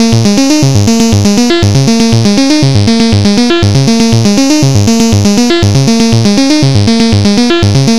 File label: bloop 240